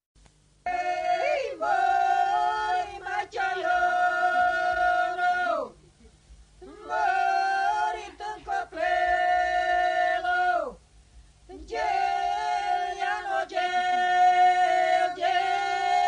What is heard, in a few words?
bulgaria, polyphony